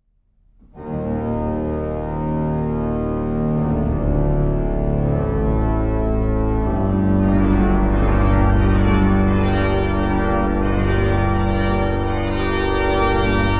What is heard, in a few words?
Prelude